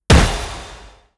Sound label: destroy layer, brickwall, destruction